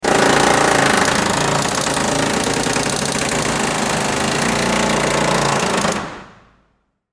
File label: Pneumatic Wedge; Pneumatic Wedge Struggle; Machinery; Industry; Machines; Blastwave